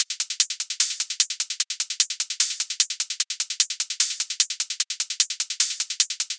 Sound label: hat loop 150 bpm; horizon